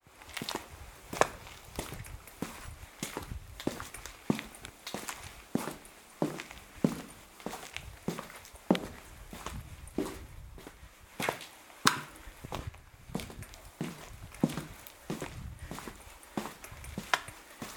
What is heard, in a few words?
Footsteps On Concrete Floor